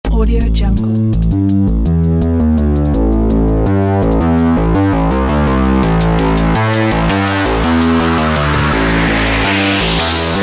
dubstep

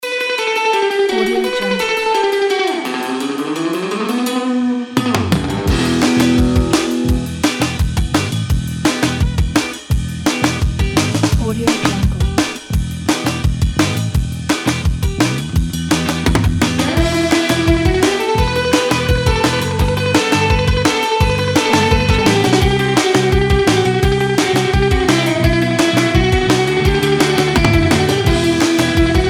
summer surfing surf rock

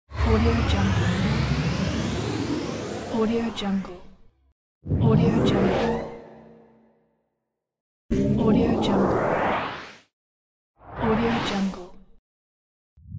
power up

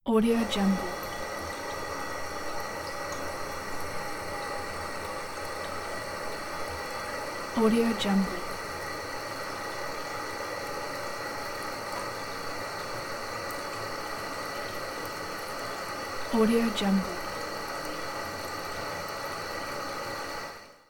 pipe buzzing

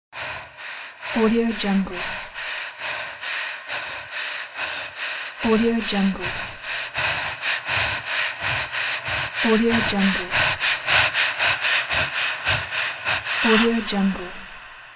panic breath

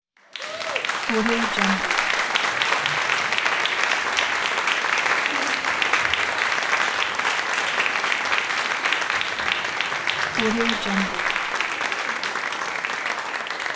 applause cheering

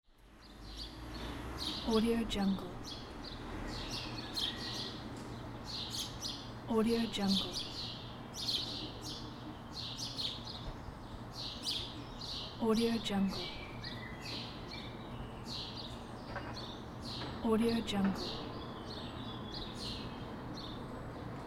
rural town ambience

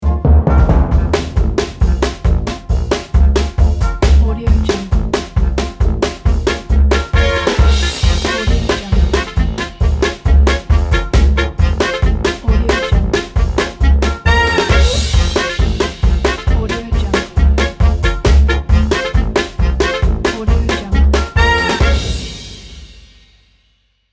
balkan ident

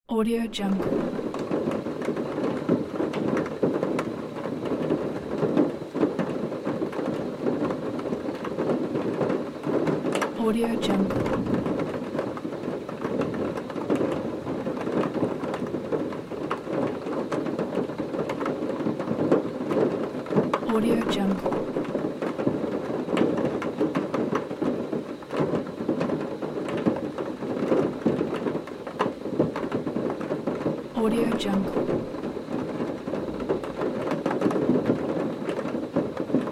rain on the roof

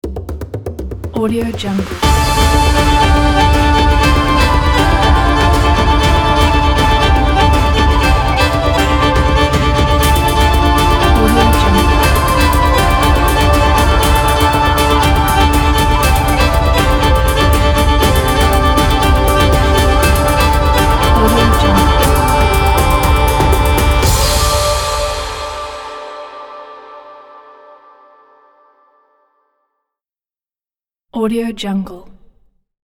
celtic background

